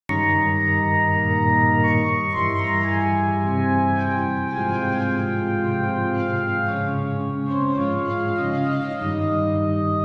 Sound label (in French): Meditatio, Orgue